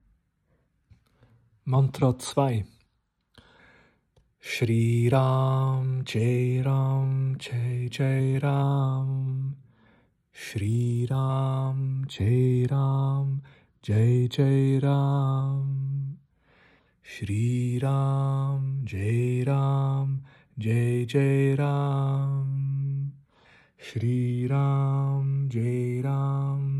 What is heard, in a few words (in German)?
Mantra Rama